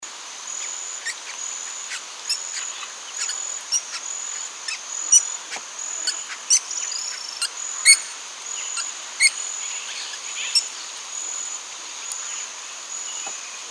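Carpintero del Cardón, White-fronted Woodpecker, Melanerpes cactorum; cantos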